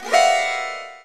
zither; Greytsounds VOL-1

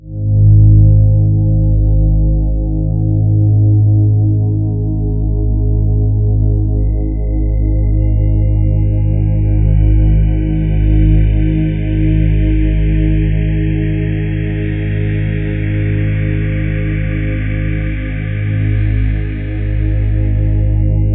chimes, Ominous Drones; LOWTONES; USB Soundscan vol.48; AKAI

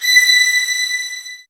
SOFT VIOLINS

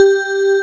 WARM BELL G4; Greytsounds; Scott Peers Sound Engineering

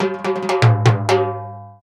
Timbales Fill, Roland BK-5; Percussion

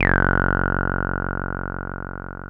TB 303

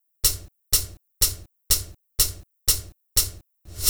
Hi-Hat Rhythm; Sonic Foundry; Rondo Brothers Trip-Hop Loops & Samples

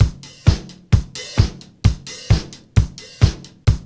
BEAT1 L; USB Soundscan vol.32; Roots Disco; AKAI

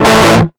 guitar stab

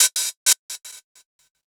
Gated Cymbal; musicradar

